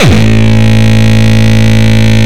Longkick, kicks

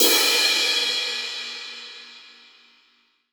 brush; Northstar - New Gold VOL-2; 50S BRUSH

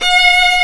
RV VIOLIN, POP

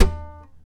TAP, FLAMENCO Guitars

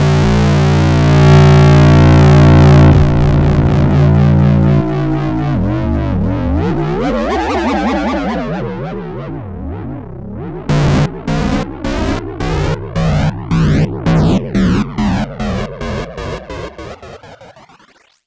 Mess Delay Bass, Drum n Bass; KJ Sawka; Drum n Bass & Beyond

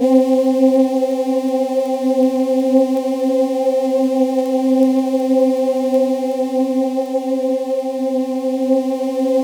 C4, Synth Leads

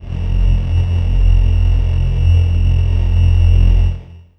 syn, FAIRLIGHT; ProSamples vol.55 - Retro Sampler; Best Service

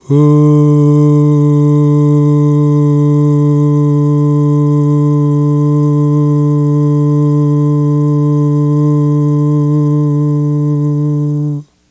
AHHH, Vocal; Polyend

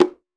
Tabla Te; Roland BK-5; Percussion

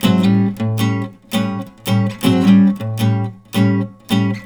riffs, Blues Guitar; ILIO; Fretworks

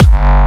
Jump Kicks, Bassdrums

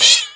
RSS, 160 BPM

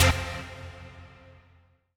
HOUSE, House Dance

